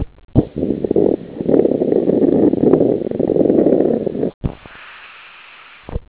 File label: scuba